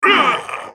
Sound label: dying; Brand